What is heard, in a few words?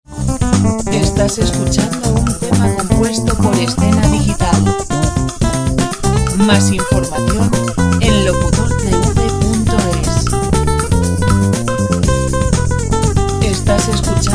120 jazz; Royalty-free music